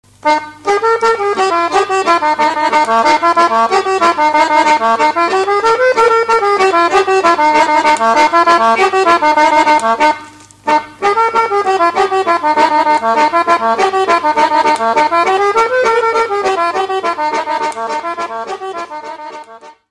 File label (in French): Dobrudzanska Ruka - Bulgarie